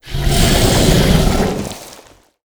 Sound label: Giant Sand Worm Attack